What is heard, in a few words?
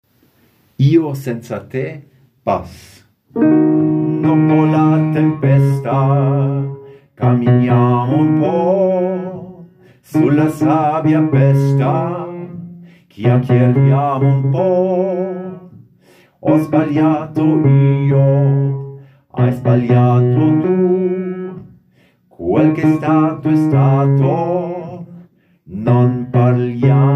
Bass